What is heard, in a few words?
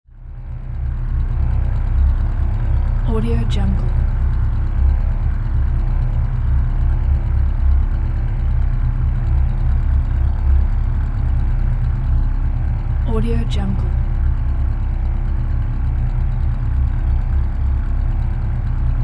space vehicle idle constant space vehicle